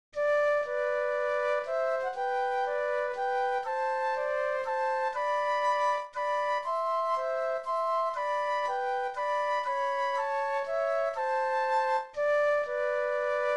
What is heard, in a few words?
Flute Duet